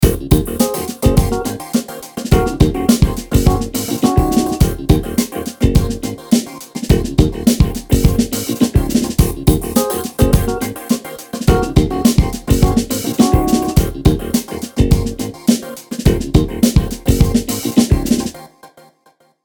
Stick Electro

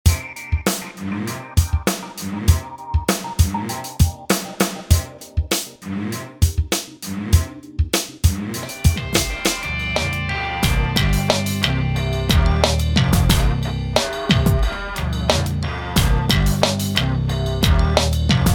Hi Pitched Snare

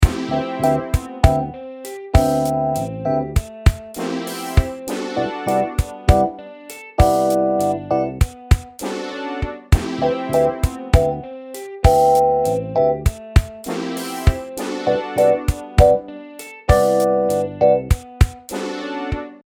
Rhodes Compare

